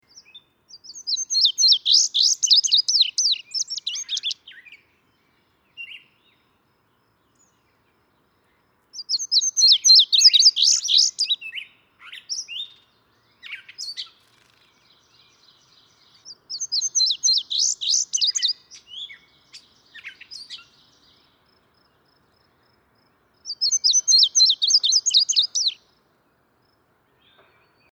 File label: Indigo Bunting Passerina cyanea